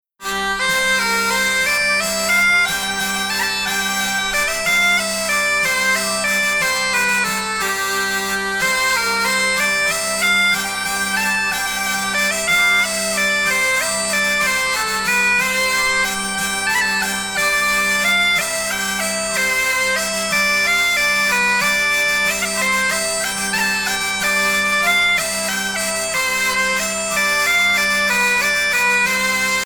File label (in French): Valse; Valse de Saint Julien